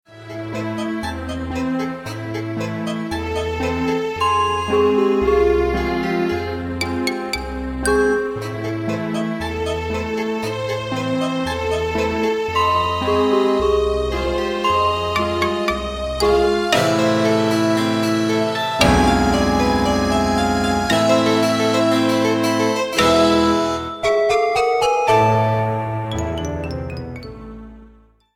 Night Organ Room; Quicksilver

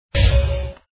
square door

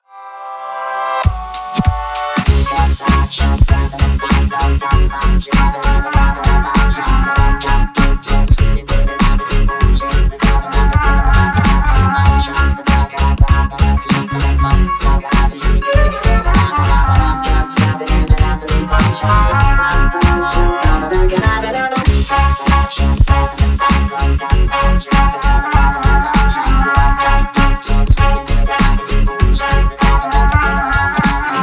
Robot Gypsy Jazz

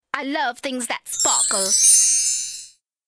Moo; tootsville

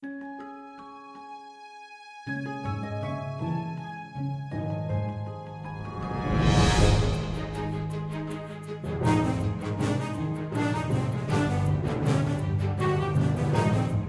Magical Music; tootsville

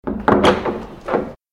Wood Over Door

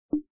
square blip; Quicksilver

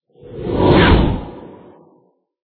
comic zoom; Quicksilver

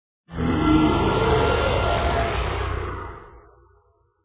dino growl

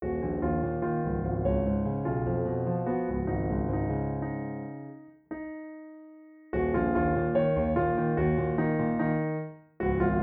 Piano Music

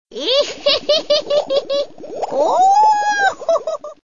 halloween Bubbling Cauldron

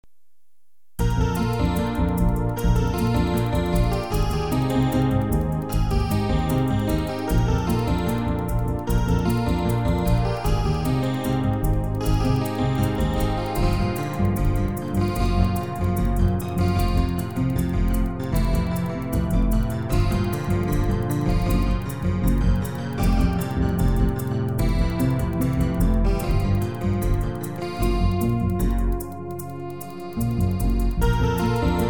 silent Invade, games music

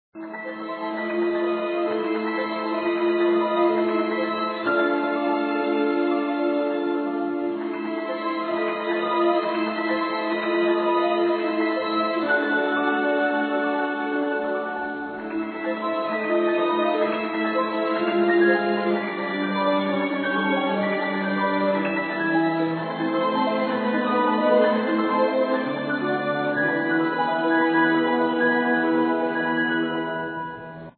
enchanted song; Quicksilver

